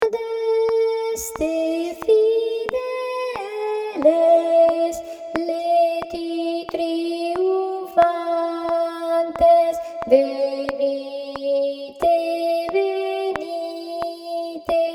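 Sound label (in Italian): contralti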